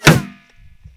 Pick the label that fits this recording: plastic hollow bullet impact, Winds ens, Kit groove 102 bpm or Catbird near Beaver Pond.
plastic hollow bullet impact